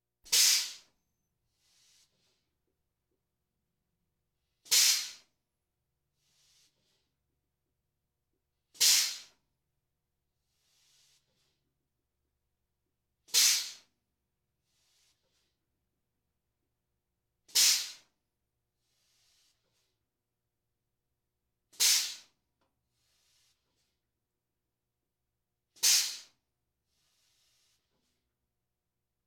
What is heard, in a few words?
Police, Fire engines